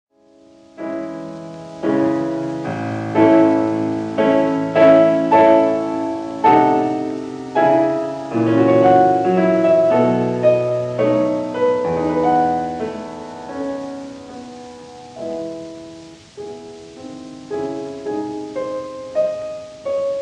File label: Symphonic Etudes for piano, Theme, Andante; Schumann; Op. 13